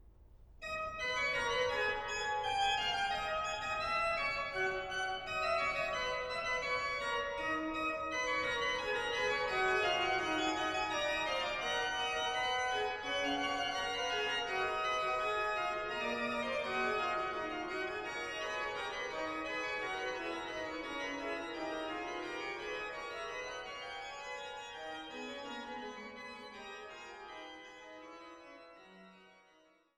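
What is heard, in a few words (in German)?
Fuge g-Moll, Orgel; BWV 542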